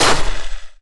ice die

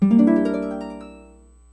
song

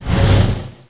ghost flame

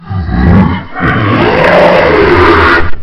fiend

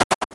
freeze

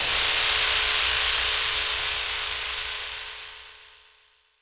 evolve

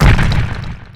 rock hit

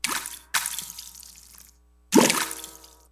water drain

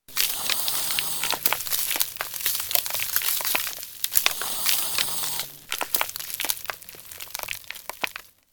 spider nest

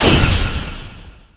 noise

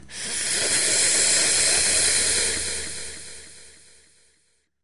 snake pit

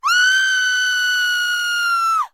scream

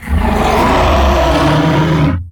dragon

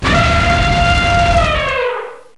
elephant

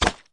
bow

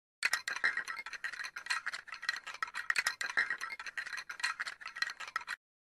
Skeleton Rattling Sound Effect

